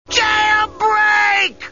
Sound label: break